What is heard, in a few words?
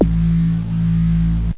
FM Moog Sub Bass